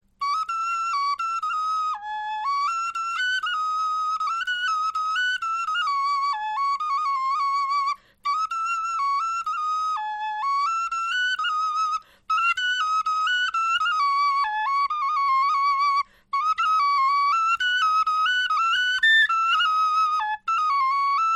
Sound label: melodie, knochenfloeten